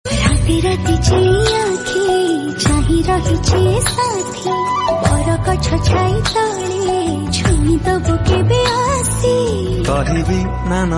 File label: Odia Song Ringtone